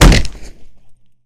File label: Fall Hard, Impacts; zps